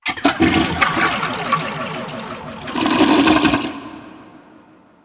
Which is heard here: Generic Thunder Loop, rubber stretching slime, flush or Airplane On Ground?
flush